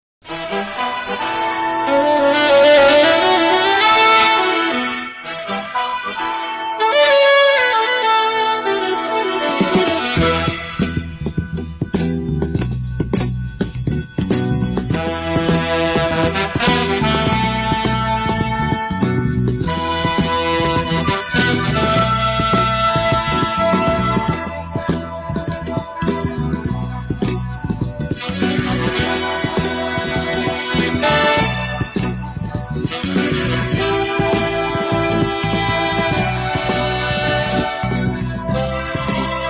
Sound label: flute; 東芝音楽工業